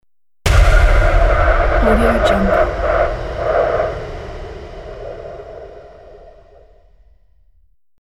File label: impact hit